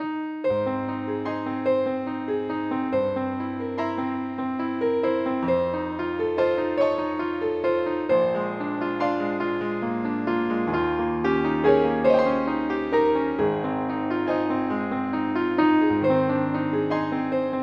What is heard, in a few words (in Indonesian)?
Nada Dering Nokia Jadul; Liszt